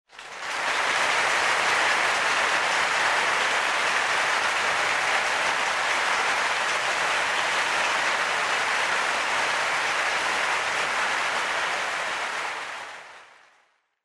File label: Applause Crowd Long